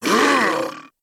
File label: monster